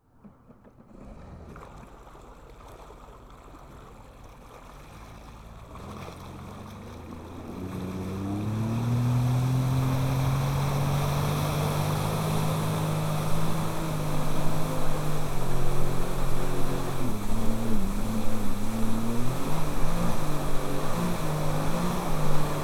Personal Water Craft Sea Doo GTS 130 On Joyriding Off, Jet Ski; The Chris Alan; PWC